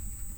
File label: Hydrophone